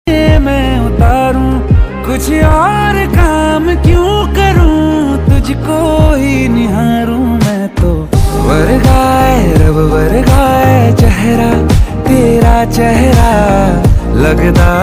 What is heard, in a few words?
hindi ringtone